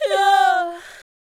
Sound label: Fem Vocal 128bpm G FX, African Voices